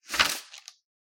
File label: shuffling papers